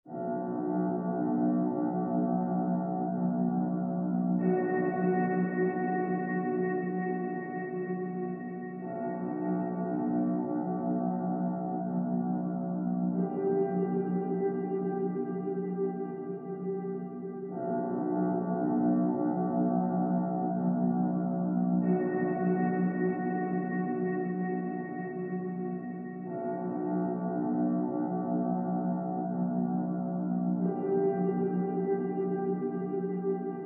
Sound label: Atmosphere